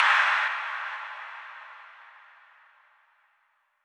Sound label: Noise Impact Burst; M8; Electronisounds; DnB Rebellion One Shots